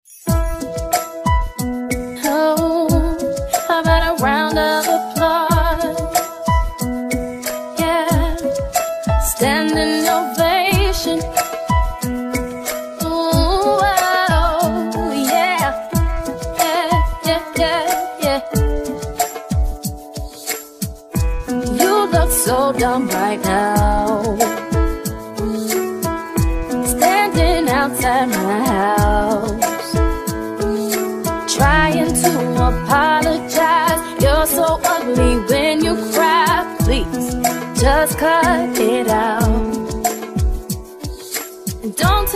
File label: Ballroom Rumba